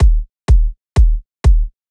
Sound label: Kicks 125